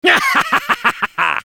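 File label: laugh evil, French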